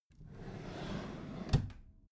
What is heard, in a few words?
empty drawer smooth open soft